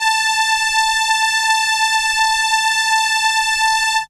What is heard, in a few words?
Violin A5, ARP Solina, synth; musicradar-synth-samples